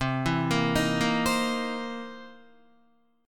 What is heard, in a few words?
C 7th Sharp 9th C Guitar; C7#9 arpeggiated; JGuitar